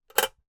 household safe small desktop metal handle drop down